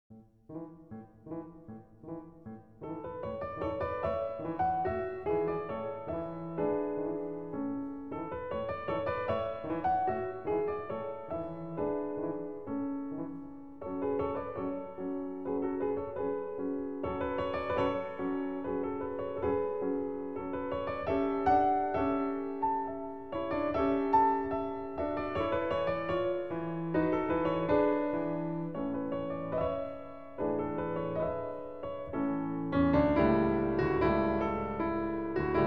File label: Norwegian Folk Songs Op. 17 - Wedding Tune; edward Grieg